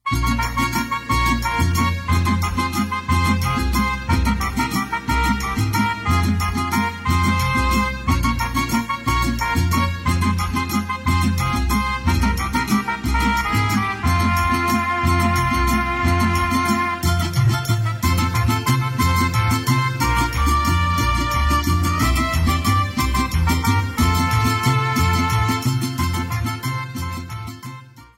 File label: Joropo Mex, mariachi